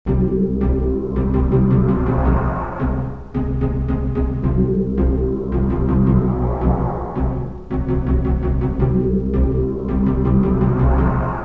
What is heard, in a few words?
spook ambient